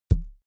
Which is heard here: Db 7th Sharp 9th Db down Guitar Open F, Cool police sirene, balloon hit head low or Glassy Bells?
balloon hit head low